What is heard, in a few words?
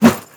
spade heavy; melee weapons; tfa starwars melee weapons pack